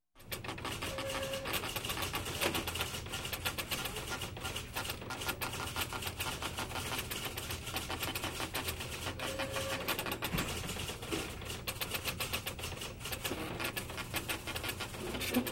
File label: Printer